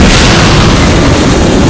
giant flamethrower start; video games; source engine; mvm

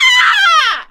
witch pain

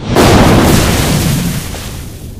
car impact; Source Engine; video game; npc

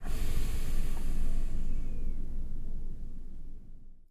taunt puff ah; video games; source engine; tf

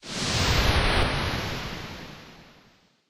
spy disguise; video games; source engine; tf

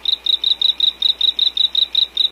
animal call frog loop; Source Engine; tf; lair

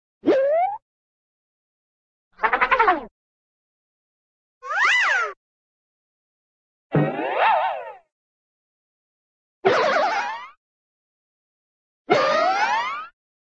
take, cartoon tube take

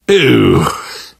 reaction disgusted, survivor voice; source engine; left 4 dead 2; namvet

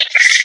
box monster chitter

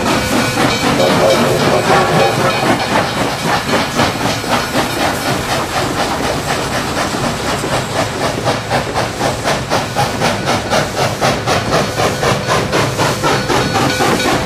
slow train loop, ambient; Source Engine; tf

